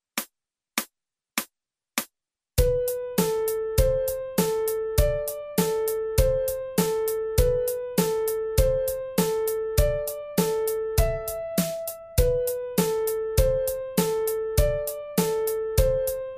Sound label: Piano